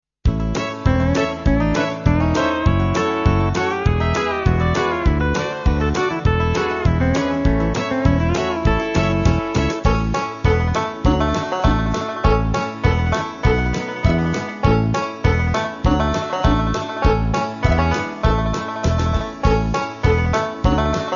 String Band; String Street; World Tour

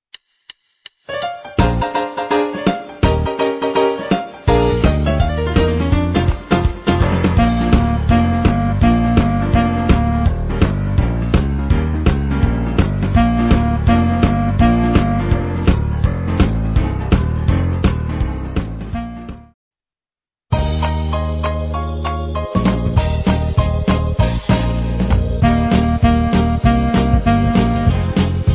Clarinet Samples

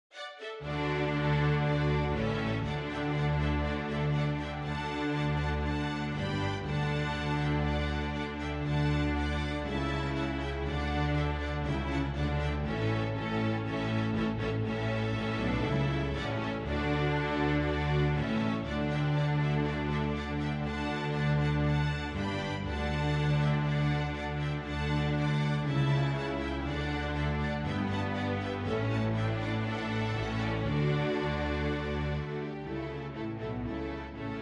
String Orchestra; Rondeau

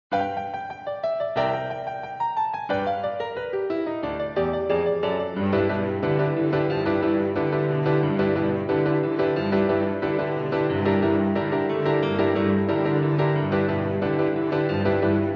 Cello

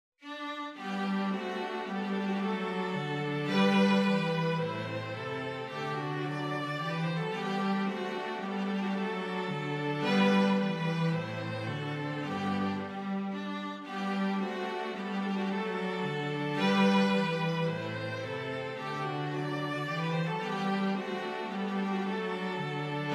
Menuetto

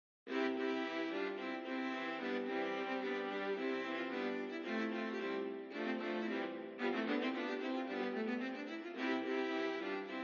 Trio in C Major

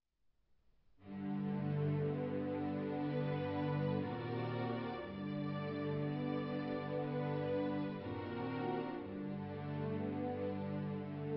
String Quartet; Nessun Dorma

